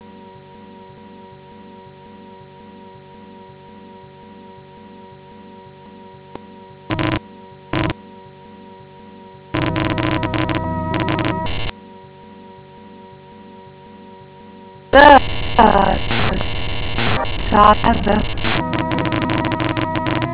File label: Rain Forest Elegy